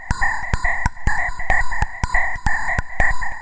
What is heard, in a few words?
140 hexbeat, DRUM LOOPS and BREAKS